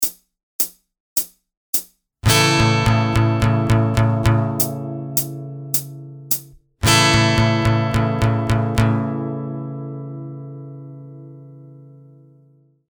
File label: The Power Rock Strumming Pattern, capo 2, SLOW; Ultimate guide to strumming book